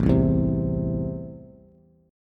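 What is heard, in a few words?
A Minor, Bass, chord strum; Am strummed; JGuitar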